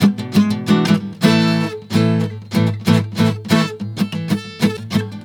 GUITAR, Balkanish Traditions

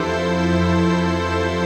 CHRD PAD; Best Service; XX Large Pads; Sample CDs

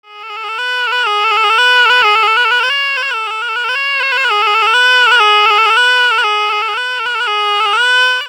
Shenai, Wind Instruments; Sonic Foundry (Sony Creative Software); World Pop

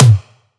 Tom Mid; Antidote Advent; Infected Track Drums

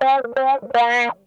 TIMER, Funk Guitar; Zero G - Funk Guitar

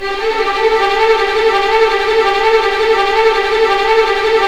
RIVER; East West; Scoring Tools; ORC; River Flow; River Trills

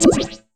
noise percussion; AKAI S6000 CD-ROM - Volume 5

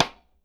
SIDESTK, LO-FI KITS; Spectrasonics; Burning Grooves CD2

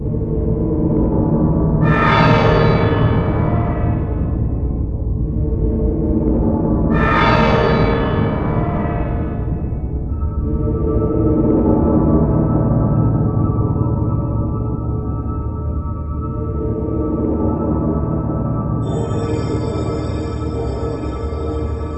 evil machines; Zero-G Ambient 1

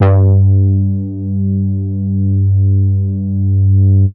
G2, BASS; Q Up Arts; Dream Experience; BASS BANK 13

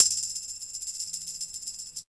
PERC, PERC LOOPS

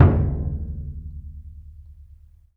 AFRO DUNDUN, LRG DRUMS

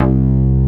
MOOGY BLUES, BASSOUNDS; Masterbits; Dance Trax

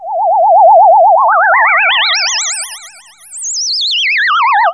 ANALOG ECHO; Zero-G Ambient 1

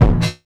bass drum, Drum & Bass, 160 BPM; Ueberschall; Drum & Bass Flash Trackz

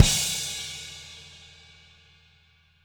CW KIK -L, Living Drums, SD CYMBALS; Sampleheads; Peter Erskine

